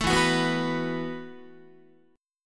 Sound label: E Suspended 2nd Suspended 4th E, down, Guitar; strummed; JGuitar